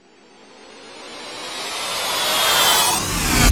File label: pickup shield; powerups